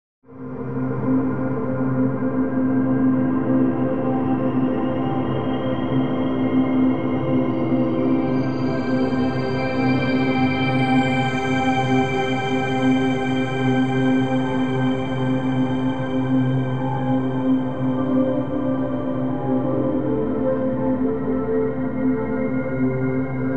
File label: TOTAL INFINITY DRONE